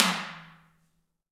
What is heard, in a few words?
TALK DRMS; Heart of Africa 1; Spectrasonics